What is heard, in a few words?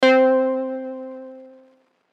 Pluck One Shot